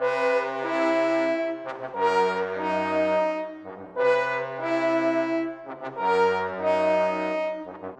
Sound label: Brass